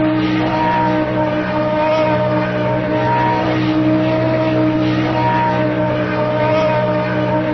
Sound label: engine low rpm